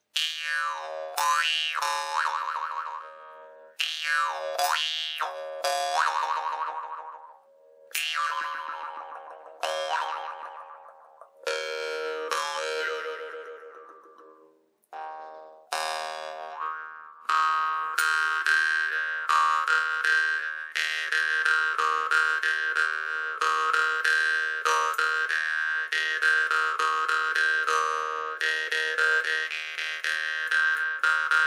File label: jews harp